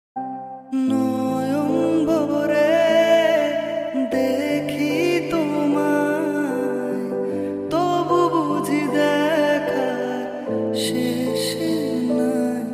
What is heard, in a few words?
Bengali Romantic Song Ringtone